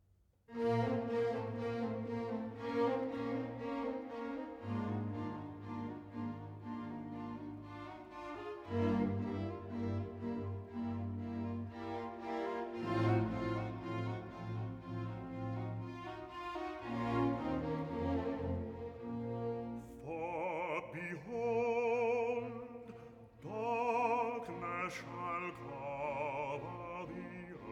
Recitativo (Bass) "For behold, darkness shall cover the; Messiah